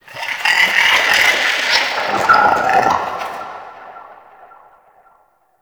Under City Medium Creature Chatter; Ambience; Dungeons; One Offs

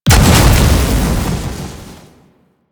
Plunge Ability Angry Explosion; Enemies; Abilities; Warframe; Duviri; Duviri Dax; Dax Knight

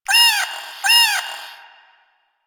Cat Loot Crate Meow; CatLootCrateCommon

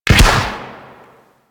Grenade launcher Shot; Warframe; Weapons; Corpus

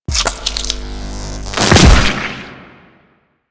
Melee Mid Spin Flesh; Warframe; Infested; Ancient

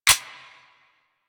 Revolver Pistol Mech

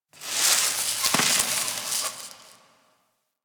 Ice Sizzle Amb

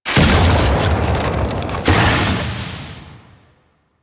Ice Bridge Collapse C; Warframe; Corpus Ice Planet

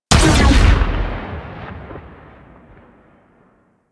Sniper Rifle Alt Shot; Warframe; Weapons; Corpus

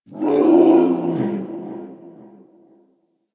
Forest Distant Creature Medium Cry; Warframe; Grineer Forest

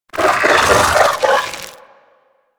Amalgam Moa Satyr Vocal Idle; Warframe

